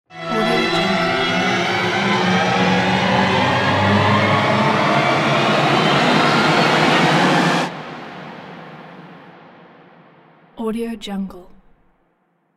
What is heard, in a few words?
orchestral horror riser